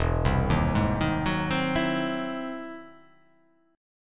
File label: E Augmented Major 9th; arpeggiated; E+M9; JGuitar